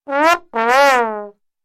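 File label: cartoon whistle sounds at the sight of a beauty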